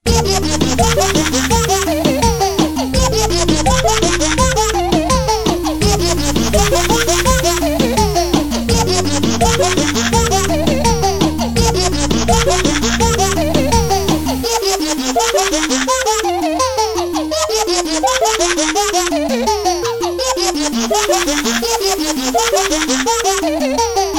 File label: Laughing Baby Lullaby Remix